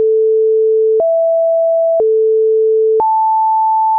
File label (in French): intervalles